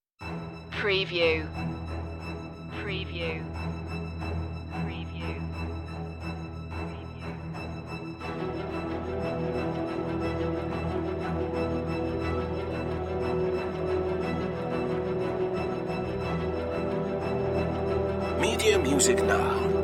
no perc

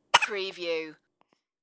Squeak Baby Hicup; Human Sounds / Other / Misc Sounds

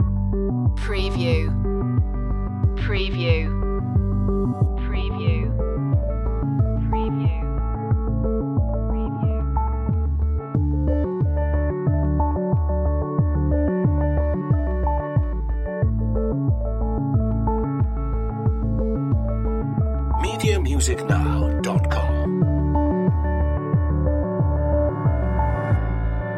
corporate sooth loop

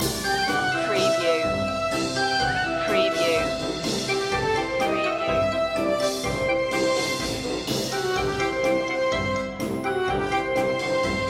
a quirky flourish loop

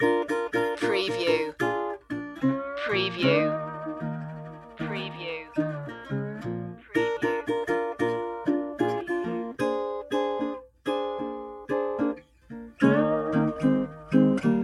blues loop

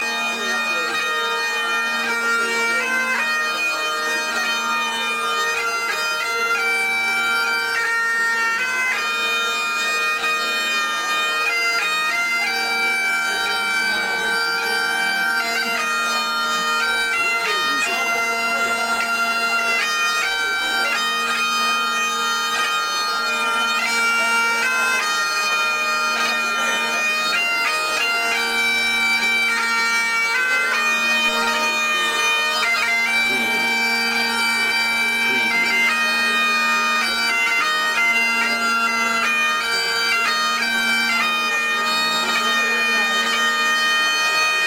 Scottish Bagpipe Performance

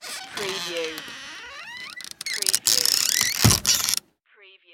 wooden hut door close

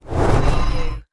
Vanish Teleport

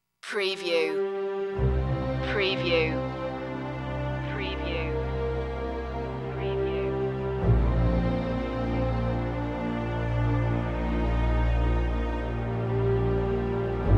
sad strings

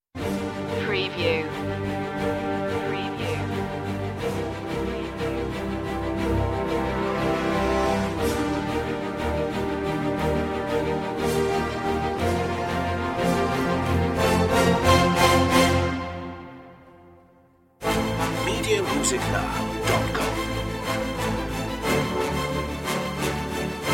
no choir no perc

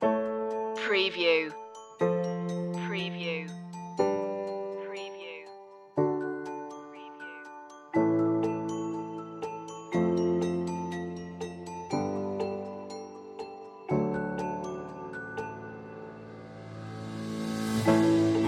bell shoppe loop

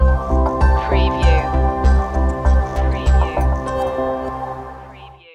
digital evolution ambient bg sting

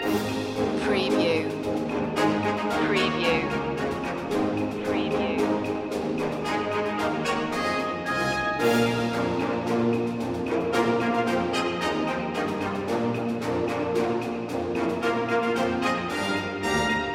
toys marching loop

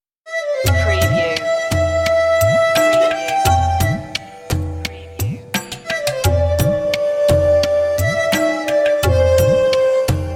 indian lullaby

